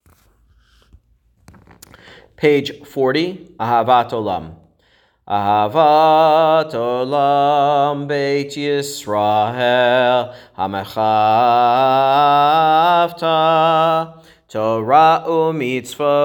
Ahavat Olam

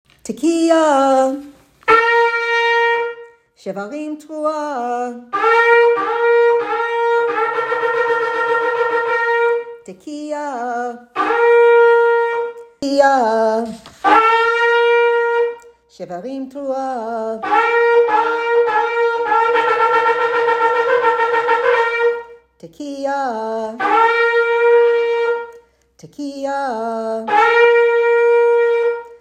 30 blasts

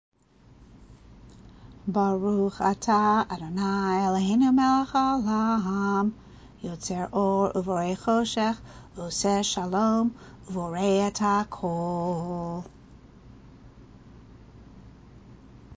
yotzer or weekday chanted; weekday shacharit

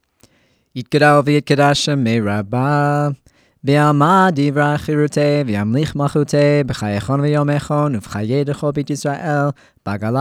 Kaddish Shalem Fast

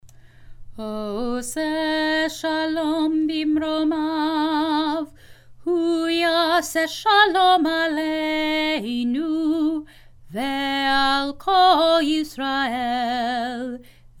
Oseh Shalom Traditional

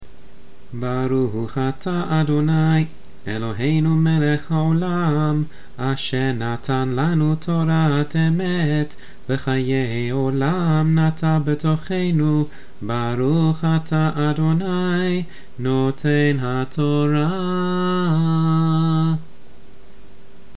Torah after

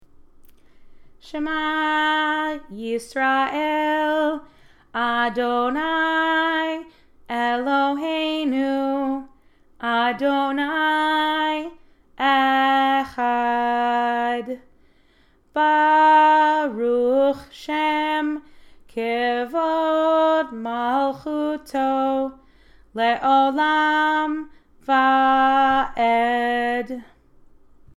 Shema traditional

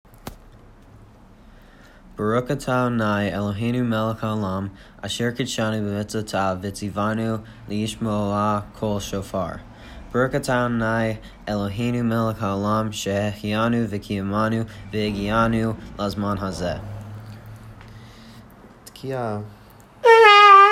shofar

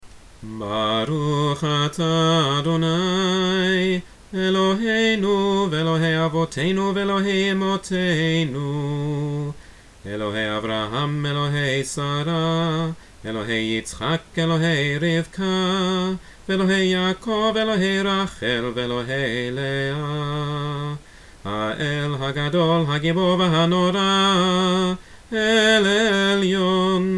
Avot chant

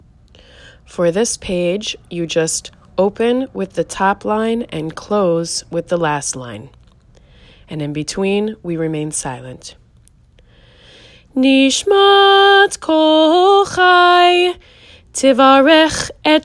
NISHMAT

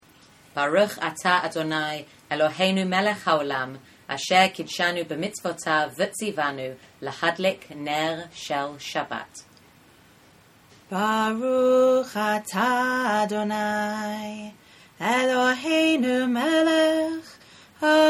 Blessing for candles spoken sung

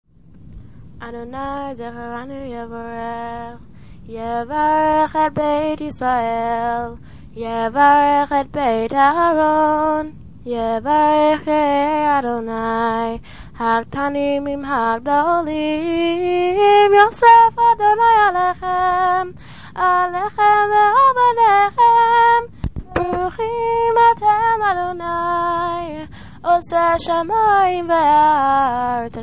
Hallel; Music Shabbat Morning

